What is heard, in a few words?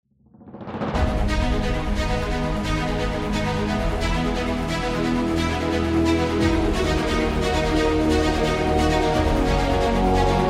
Epic Dramatic